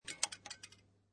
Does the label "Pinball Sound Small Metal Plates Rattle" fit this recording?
Yes